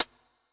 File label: 909 CLOSED HIHAT SHORT; MACKIE